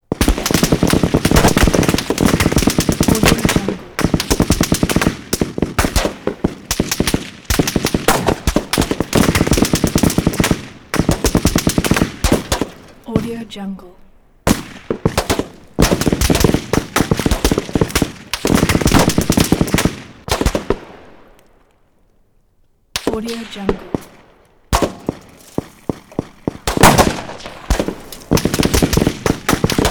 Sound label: gun battle pistols rifles single shots